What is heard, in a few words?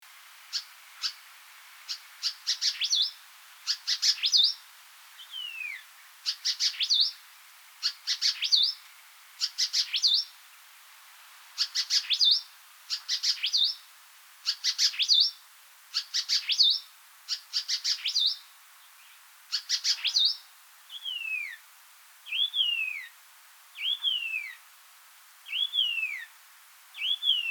Carterornis Leucotis; White-Eared Monarch; Call; Call 2